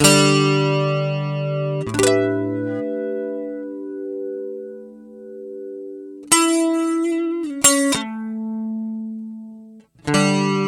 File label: Chrs GTR HOT; UAD Apollo; UAD FX